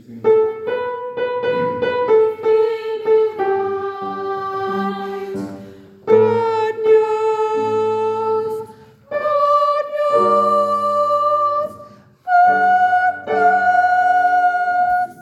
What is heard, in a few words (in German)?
Sopran